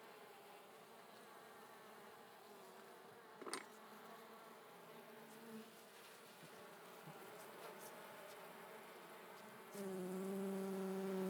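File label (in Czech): vcela, bee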